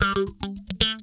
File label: Funk Guitar; Zero G - Funk Guitar